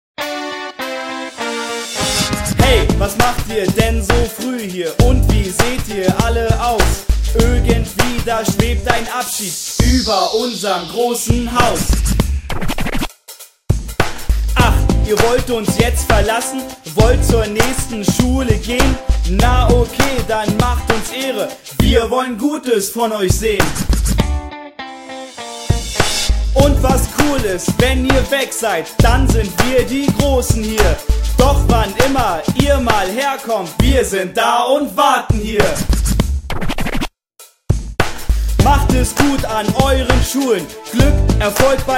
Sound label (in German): Musik Duett Abschiedsrap; Grundschulblog